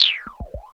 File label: NOISE; East West - Phat & Phunky